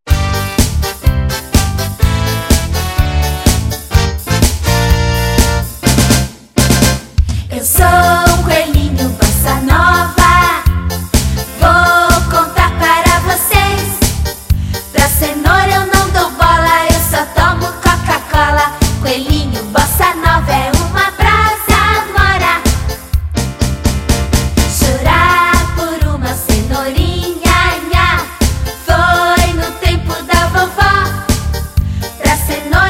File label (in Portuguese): coelhinho bossa nova